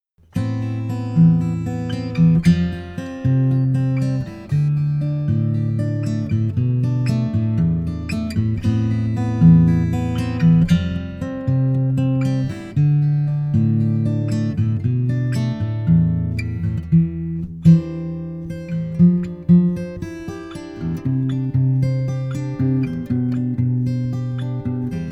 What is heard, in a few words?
acoustic, guitar